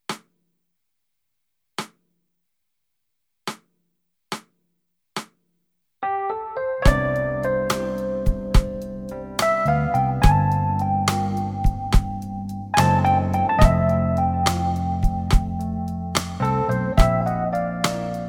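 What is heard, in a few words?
Demo with click, Concert Backing Tracks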